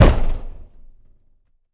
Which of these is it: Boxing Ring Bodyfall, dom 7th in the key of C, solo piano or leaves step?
Boxing Ring Bodyfall